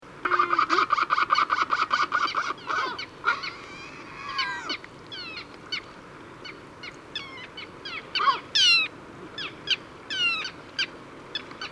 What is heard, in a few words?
Kelp Gull